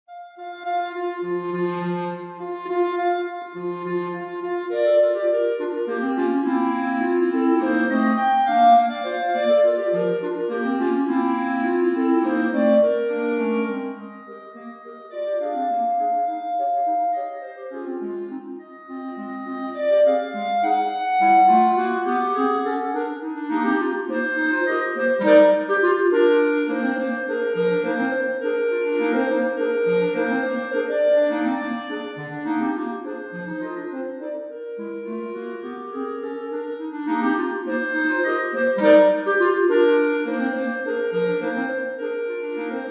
clarinet trio

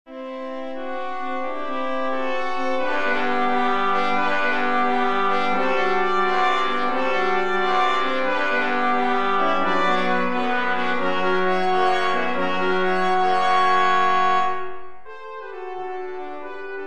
brass quartet

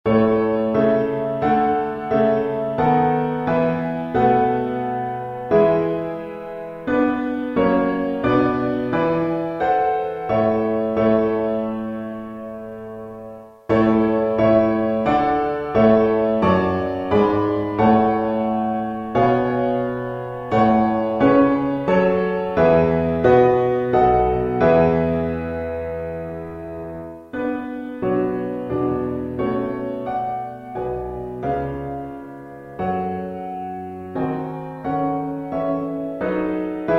pianoforte

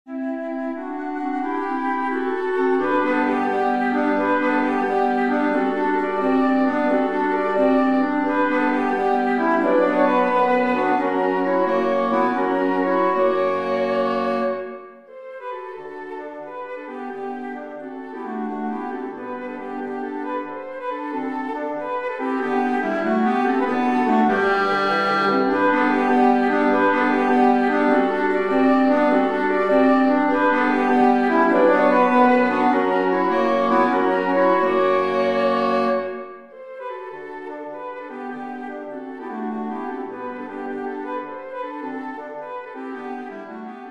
woodwind quartet

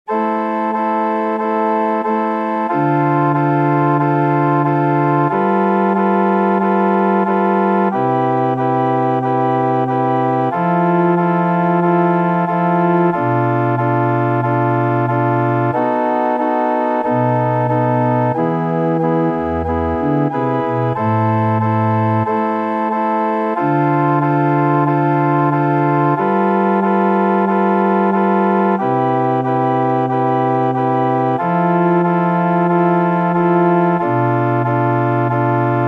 Ave Maria (Viola Solo

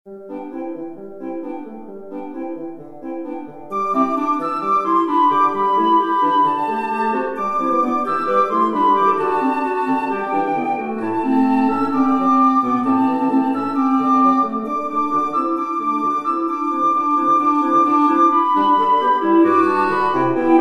woodwind trio

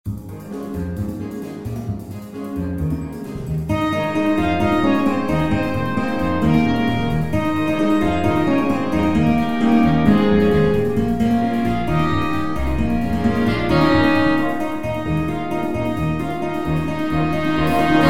jazz ensemble

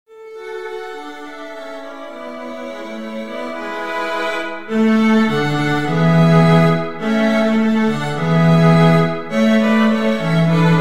string quartet

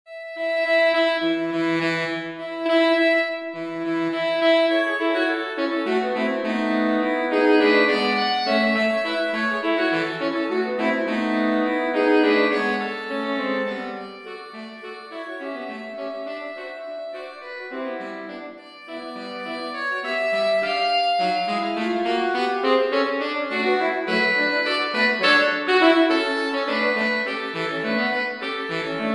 saxophone trio

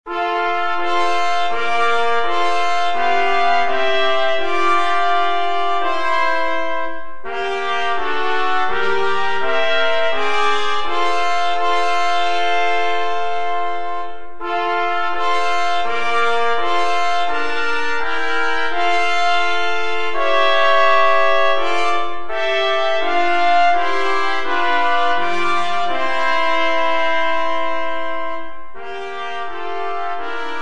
trumpet trio

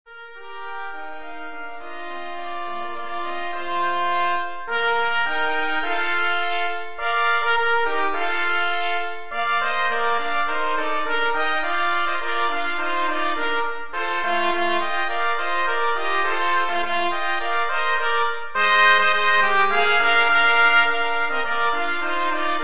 trumpet trio

